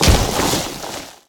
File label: icehit break